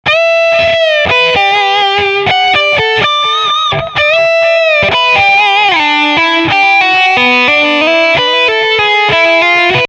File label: Solo Delay